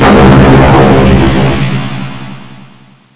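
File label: big explosion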